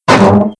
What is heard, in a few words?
Metal Crowbar Door; Playable Tutorial Level